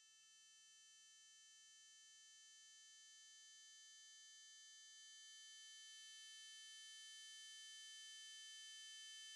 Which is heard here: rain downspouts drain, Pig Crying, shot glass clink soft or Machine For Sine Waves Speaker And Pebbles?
Machine For Sine Waves Speaker And Pebbles